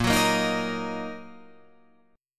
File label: A sharp Suspended 4th Sharp 5th down Guitar Open F; strummed; A#sus4#5; JGuitar